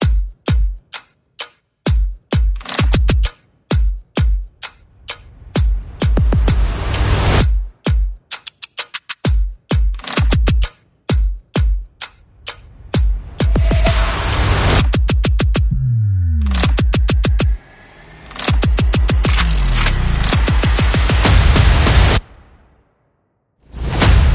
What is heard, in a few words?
Percussion and Effects